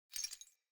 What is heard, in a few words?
Grunt Foley Movement Chains; Enemy; Sinecure; Melee Grunt